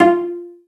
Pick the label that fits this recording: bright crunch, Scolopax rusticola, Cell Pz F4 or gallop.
Cell Pz F4